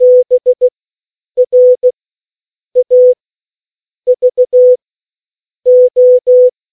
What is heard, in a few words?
morse code